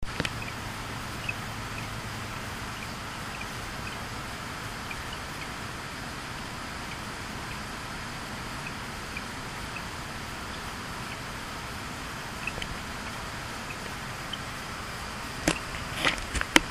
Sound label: Eleutherodactylus planirostris